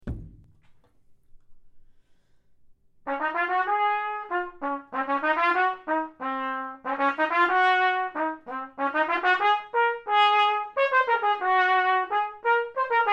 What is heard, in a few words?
trumpet